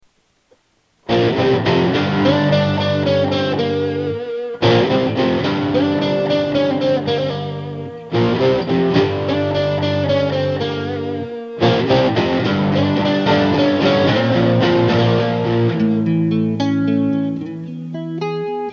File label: rock